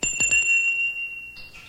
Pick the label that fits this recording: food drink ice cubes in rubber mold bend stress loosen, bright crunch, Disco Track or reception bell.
reception bell